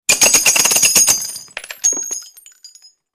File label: Machine Gun Burst Shell, eject shell